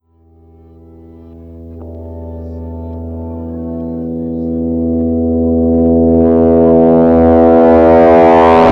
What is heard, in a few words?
Riser Guitar